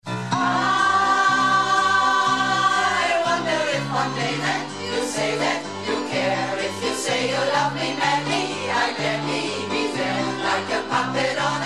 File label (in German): chor Probe